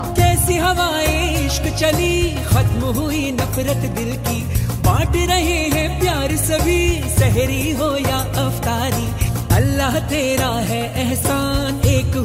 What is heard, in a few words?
Ramzan Ringtone; Noor E Ramzan